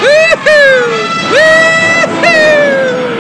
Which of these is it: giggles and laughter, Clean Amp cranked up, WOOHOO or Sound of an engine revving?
WOOHOO